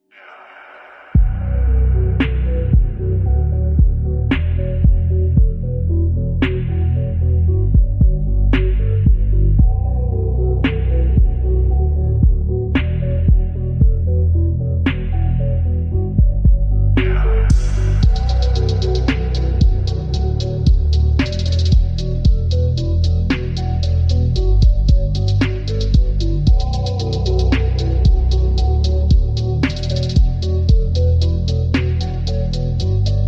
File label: slowed bass boosted